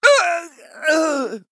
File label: Male Blood Elf Death